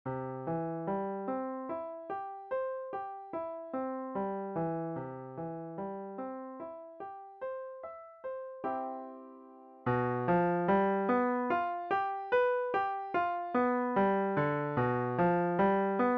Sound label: Carillon